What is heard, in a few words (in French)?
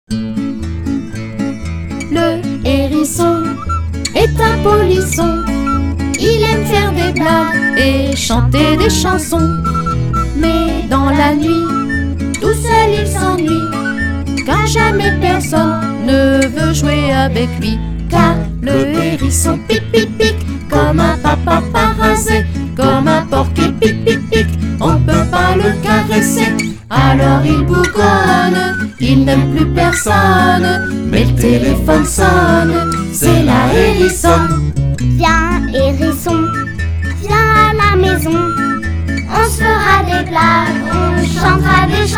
Chanson Le hérisson